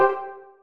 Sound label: dialog confirm; Stunt GP